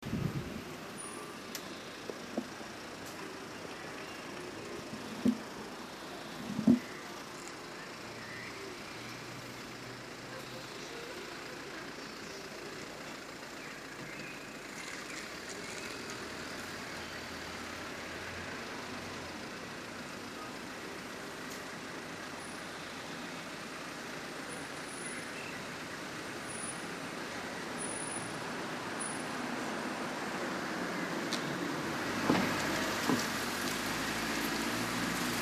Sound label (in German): Ludwigstr Rtestr normal